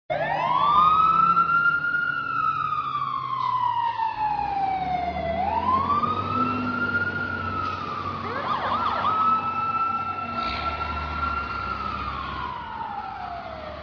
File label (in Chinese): 警车呼声